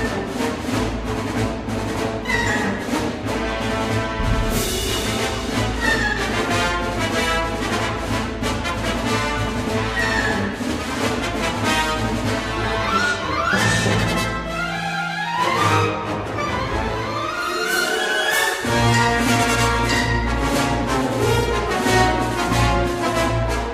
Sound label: intro music; 501st SWOP Al Rayak-Liberation